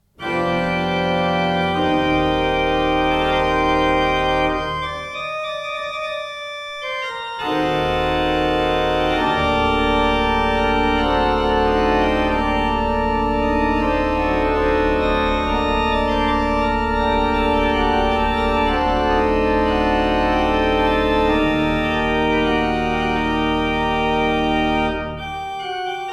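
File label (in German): Orgel, pfeifenorgeln; Seifert Kevelaer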